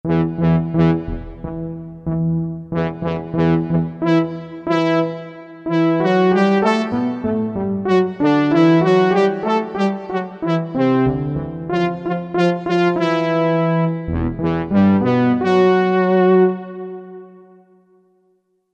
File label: Velo Open; Korg MSC-1S Variety